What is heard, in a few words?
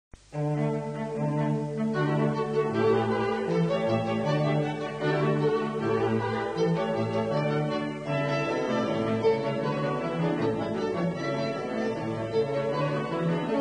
polka